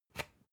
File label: usb port metallic out